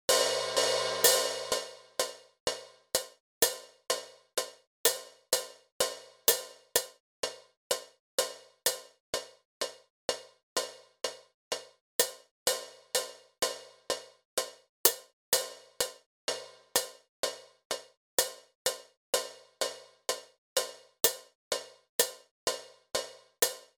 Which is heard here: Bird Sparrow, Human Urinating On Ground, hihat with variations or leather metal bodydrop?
hihat with variations